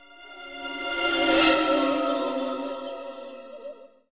stinger

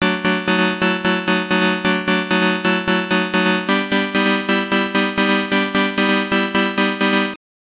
Adding Bonus Music

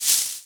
Herb Shake; Levels Hub Restaurant; Act Kitchen

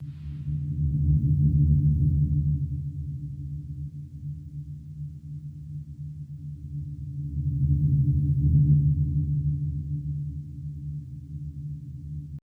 Mission fail; Far Gate; Windows gamerip 2001

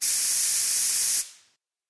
Bug Spray; Farm Mania

